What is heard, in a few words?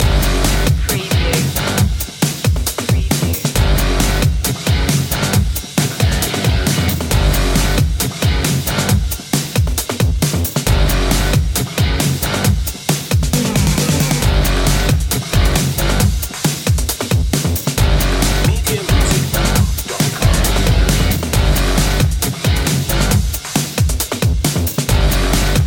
cage rage loop